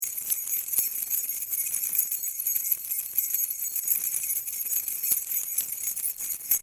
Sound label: keys